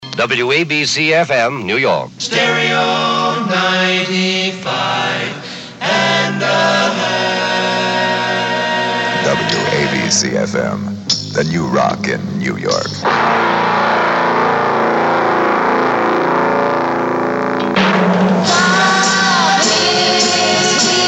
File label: jingle